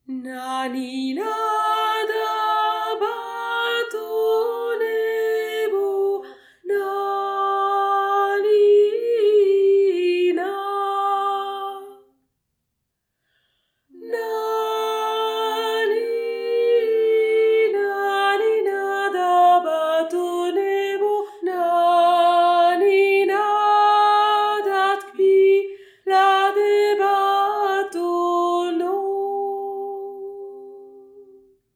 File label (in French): VOIX AIGUE